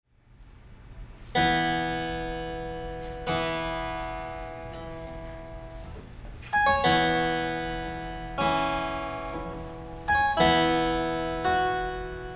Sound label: musette